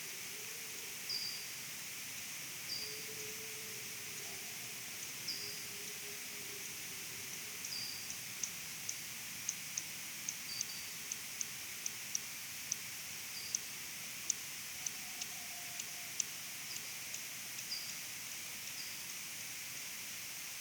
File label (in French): FM nasillard, P auritus; Correze; M-Barataud; Ecologie acoustique des chiroptères d'Europe